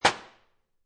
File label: Foley, Aluminum Spray Can Placed Down On Metal Shelf; Set Down